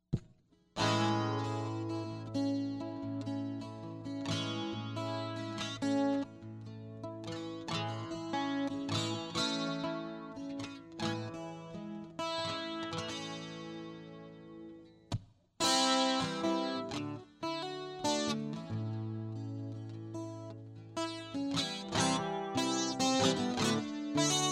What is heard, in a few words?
Guitar Loop